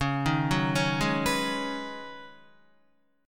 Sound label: Db 7th Suspended 2nd Suspended 4th Db Guitar; Guitar-4F,4A,5C,5G,5C,6E; Db7sus2sus4 arpeggiated